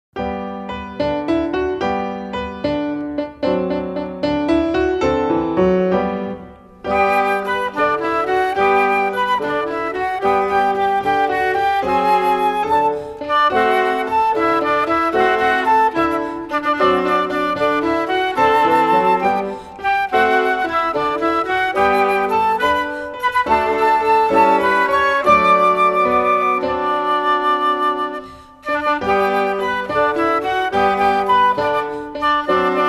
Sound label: music only; saturday; portuguese; childrens songbook